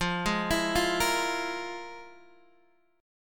F Minor Major 7th Double Flat 5th, Guitar, Standard; FmM7bb5 arpeggiated; JGuitar